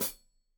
Hat Foot, Hi-Hat, Cymbals Stereo

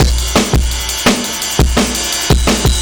Beat Mooger 170, distorted drum loops 170bpm; musicradar

